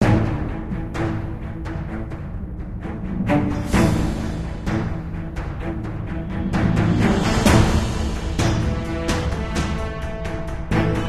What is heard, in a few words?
Music Scene; Brave Fighter Demon Revenge; Android